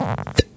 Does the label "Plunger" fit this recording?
Yes